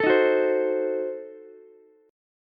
F sharp Diminished 7th, Ukulele; strummed; 14,15,14,15

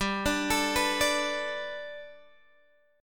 G Major Add 9th Guitar Open G, chord

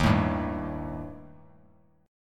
D sharp Major Flat 5th, Bass Standard; strummed; D#Mb5; x,6,7,0; JGuitar

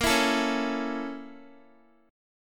Bb Minor Major 7th Flat 5th, Guitar; BbmM7b5 strummed

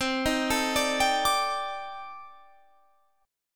C Minor 6th Add 9th C

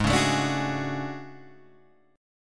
A Major 13th, guitar

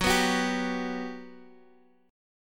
G Major 7th, F sharp

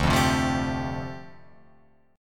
D Minor 11th D down Guitar Open F, Dm11 chord; Dm11 strummed; JGuitar

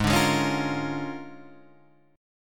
A sharp 7th Sharp 9th

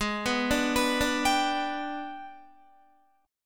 G sharp Minor Major 7th Flat 5th, Guitar; arpeggiated; JGuitar

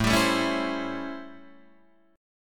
A 7th Sharp 9th, Guitar, Open D

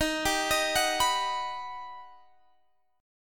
G Augmented Major 7th

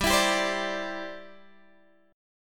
C Major Add 9th G down Guitar Half Step Down

